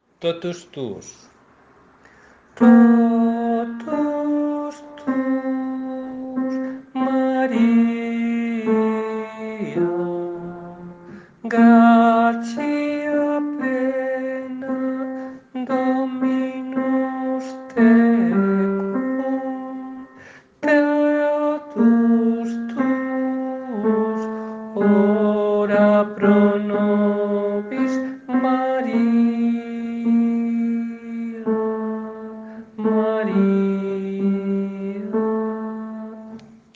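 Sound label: totus tuus tenor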